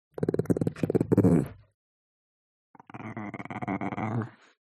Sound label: DOG Chihuahua Growl Soft; ANIMAL; smartsound